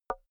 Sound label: wood board knock light